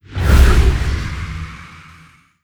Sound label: sfx corvette moving out; Stellaris; nemesis